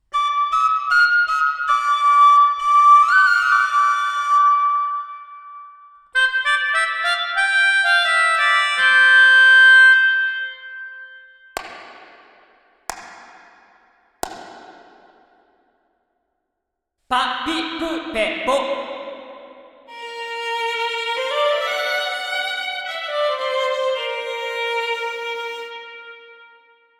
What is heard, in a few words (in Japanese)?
チェンバー：Studio 3, 最もレスポンスが速く、パンチがあるサウンド。明るさもありつつ、タイトな広がり